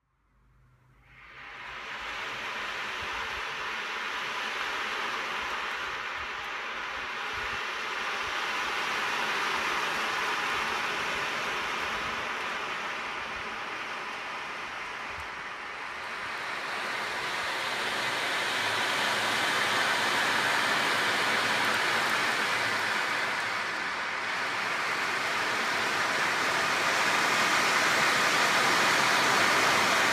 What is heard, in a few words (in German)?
Ocean Drum Wellentrommel; ocean drums